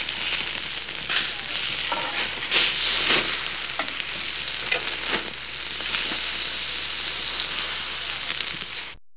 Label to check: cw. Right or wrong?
Wrong